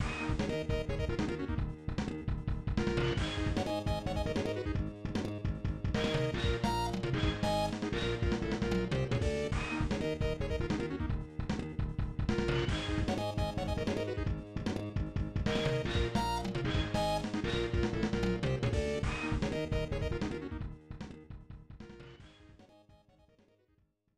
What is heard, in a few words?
Continue; Street Fighter Alpha 2; SNES